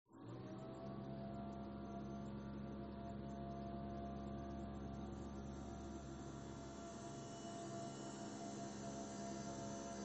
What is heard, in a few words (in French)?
flexispot eq5 bruit; vonguru